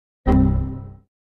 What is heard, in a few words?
Windows error sound effect